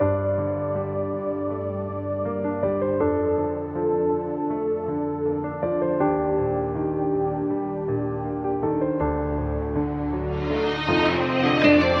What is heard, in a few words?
keys, Sad